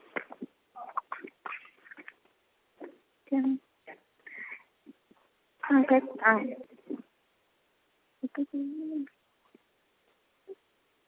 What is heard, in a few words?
noisy msg